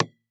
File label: Impact PVC Pipe Hit By Small Metal Pipe; Impacts